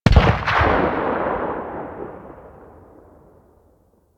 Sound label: Weapon Enemy Tail Outdoor; Warframe; Weapons